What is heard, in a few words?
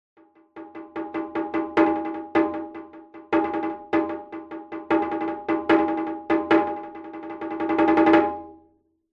conga shime daiko